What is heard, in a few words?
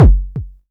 kick, Korg Electribe ER-1